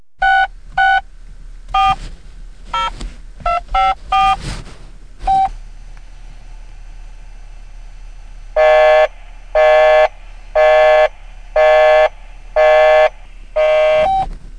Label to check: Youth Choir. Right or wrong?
Wrong